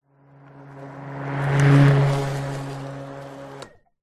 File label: household lawn mower electric pass by then off; zapsplat